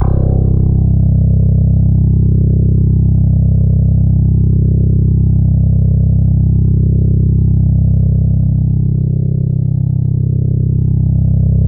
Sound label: C1, SynthLine